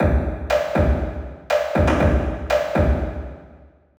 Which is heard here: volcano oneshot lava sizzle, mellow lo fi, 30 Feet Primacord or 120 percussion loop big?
120 percussion loop big